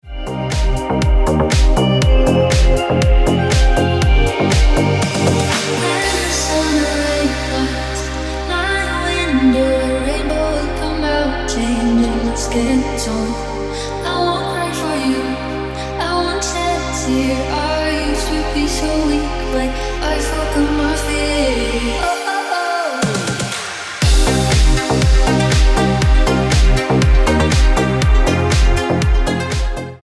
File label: Ringtone